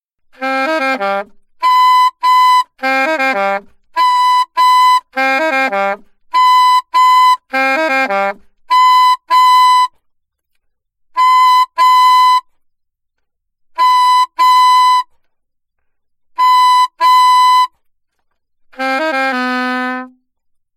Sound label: altosax